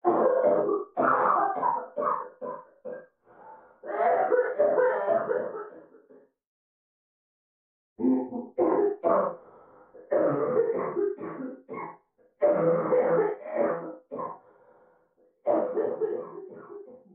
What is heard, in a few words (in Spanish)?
fondo tos